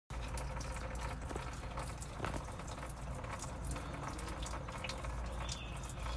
boosted miata coolant leak white smoking coming engine bay